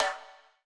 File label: perc percussion